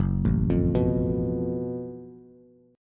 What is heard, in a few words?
Gb Minor Major 11th Gb down Bass Bass Standard; GbmM11 arpeggiated; JGuitar